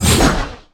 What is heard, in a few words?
portal start two; Minecraft; Votive 1.21.1; Votive Content